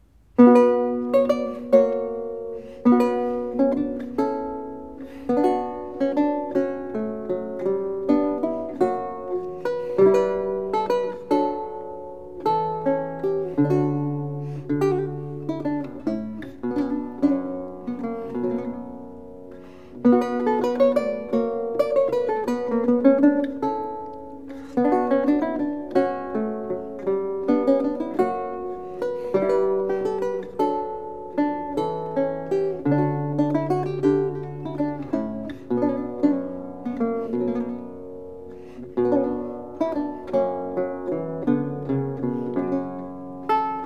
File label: Jig; Dowland - The Collected Works, Anthony Rooley